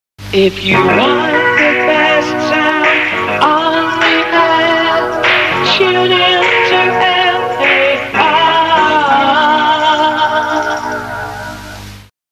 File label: jingles, best sound